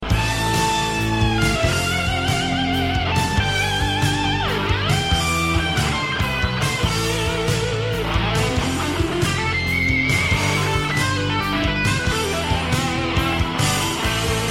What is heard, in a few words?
hard rock